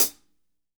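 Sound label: hat closed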